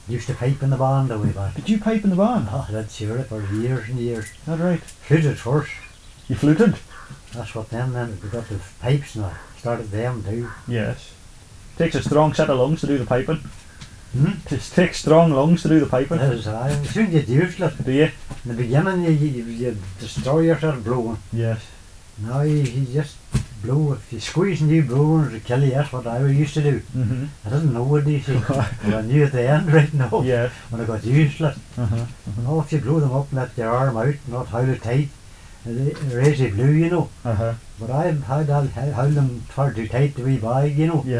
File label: Pipe Band